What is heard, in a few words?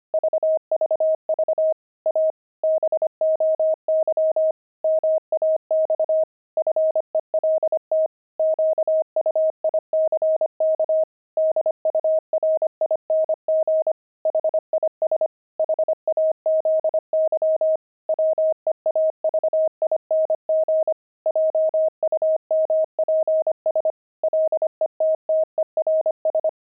pangram, intermediate pangrams files; weaving